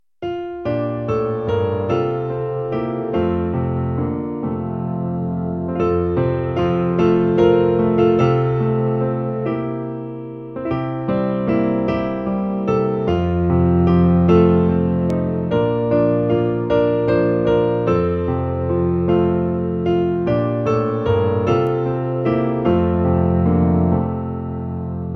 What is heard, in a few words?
Whisper a Prayer piano